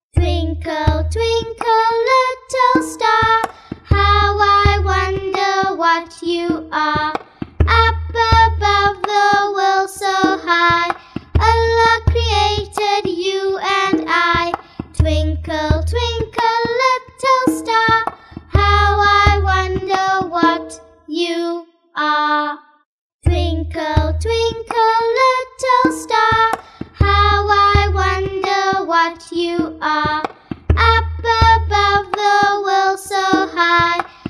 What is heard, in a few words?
Twinkle Twinkle Music